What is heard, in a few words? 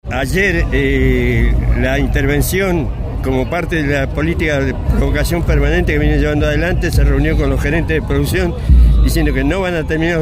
claps; astillero; rio santiago; movilizan y cortan el obelisco